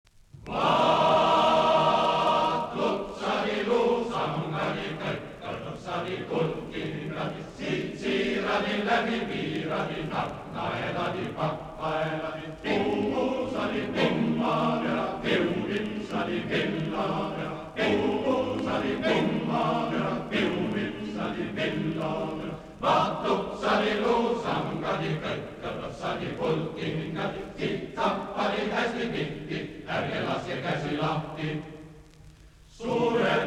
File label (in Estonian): Tansulaul